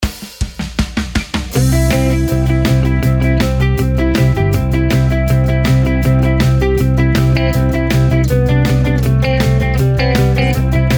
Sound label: light rock no lead